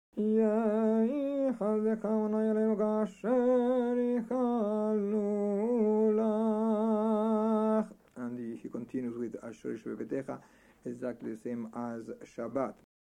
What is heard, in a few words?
Yehi hhasdekha Ashre; Rosh Hashanah Shaharit